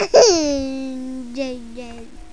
giggle

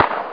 Gun Shot; Oregon Trail

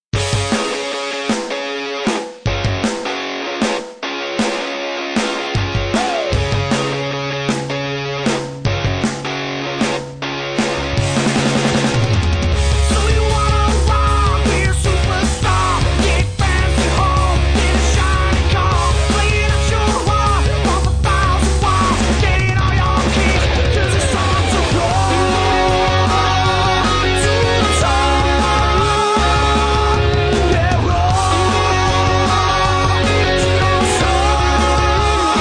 medium Guitar; battle of the bands

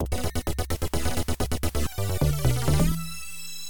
FANFARE; dukenuk2

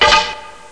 rock; Thief

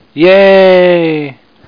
YAAA

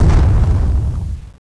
Bomb Explosion Far; A-10 Cuba; PC Gamer 40

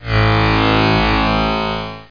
deep tam; Ashalii 1.3.1; Fun & Games

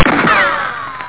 RICOCHET

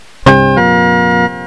MUSIC

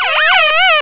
ERASE

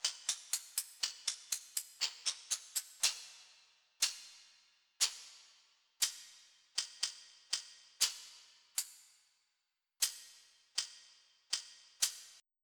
Caxixi

